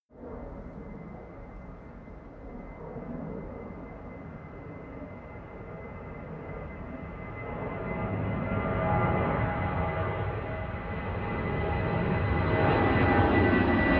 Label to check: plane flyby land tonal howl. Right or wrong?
Right